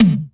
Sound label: EFFEX PERC, effected drum; Crypton - DrumBase5000